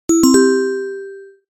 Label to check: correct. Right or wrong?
Right